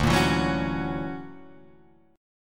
D Augmented Major 9th, Guitar, Open D

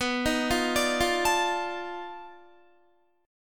B 7th Sharp 9th Flat 5th, down, Guitar; B7#9b5 arpeggiated; JGuitar

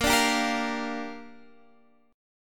A sharp 6th, Guitar Standard; strummed; JGuitar

